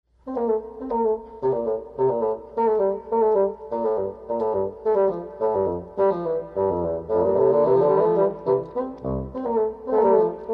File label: duet